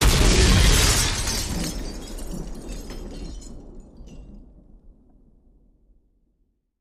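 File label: Explosion Glass Debris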